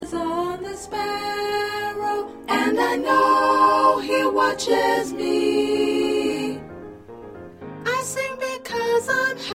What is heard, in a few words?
SSA